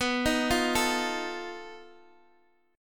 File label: B 7th Flat 5th B Guitar DADGAD